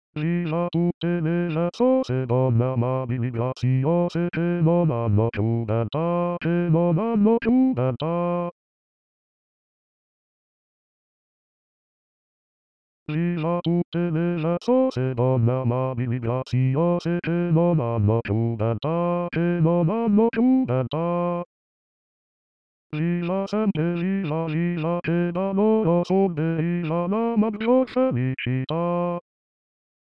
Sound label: Bass; Giardini; viva tutte